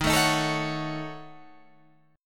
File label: D sharp Minor 7th Flat 5th D sharp down Guitar Half Step Down